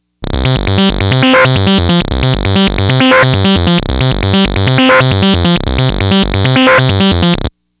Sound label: saw resoverdr